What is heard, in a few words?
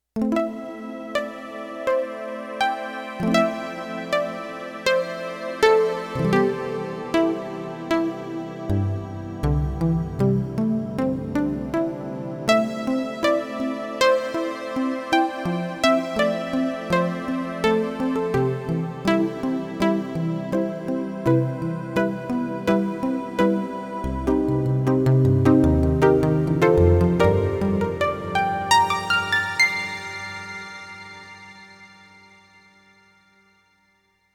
Space Harp, Roland VC-1